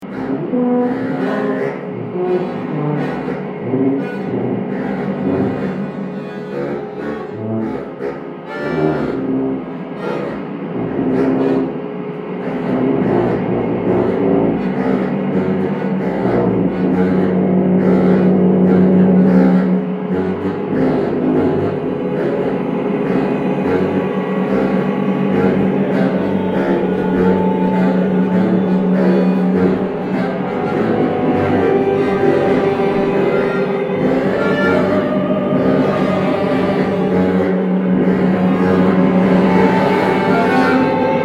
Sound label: Mostly Sax